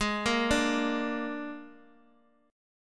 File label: G sharp Suspended 2nd Flat 5th, Guitar, DADGAD; x,x,x,1,1,0